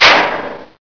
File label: bust metal, debris